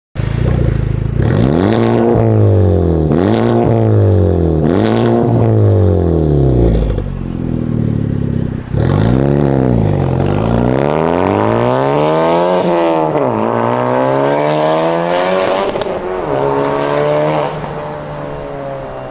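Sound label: Honda Civic, exhaust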